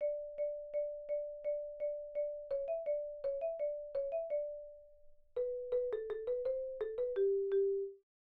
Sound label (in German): Rhythmus Ubungen; Notenlesen lernen